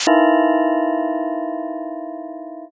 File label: sting